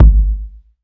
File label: big bass drum one shot g key, Royality free kick drum sample tuned to the G note